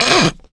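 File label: laughter, male; survivor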